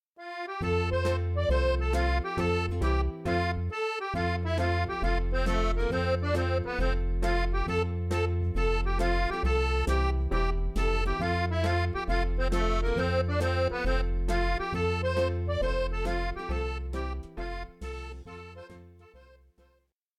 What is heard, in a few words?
folk dance music; anemoi